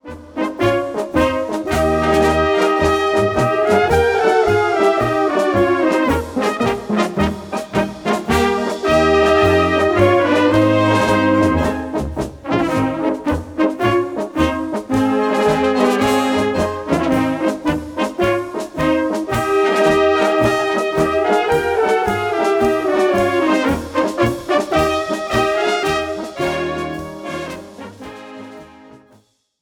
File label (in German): Polka